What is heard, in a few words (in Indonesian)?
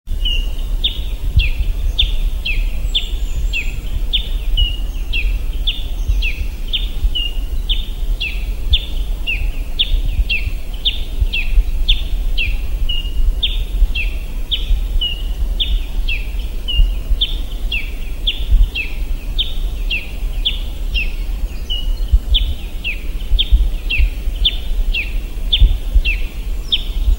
burung madu gunung